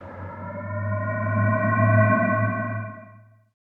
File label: synth misc, retro synth; retro synth SFX